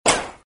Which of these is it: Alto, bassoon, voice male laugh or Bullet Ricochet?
Bullet Ricochet